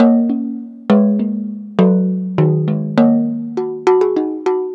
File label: Jug Hi Melody; Ambient; Melodic; Ambient-Melodics